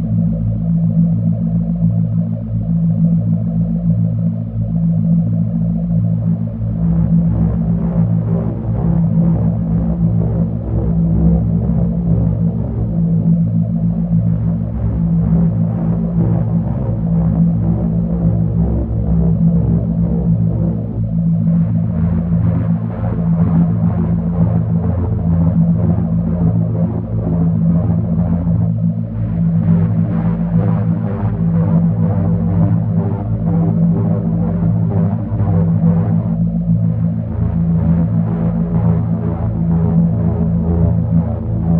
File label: glow atmospheric background